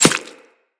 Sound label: Arrow Impact Flesh; Weapons; Bullet Impacts